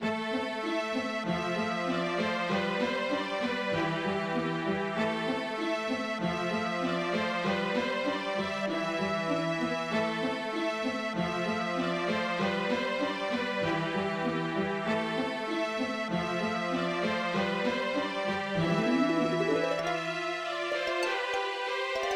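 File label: phase, techno bass drum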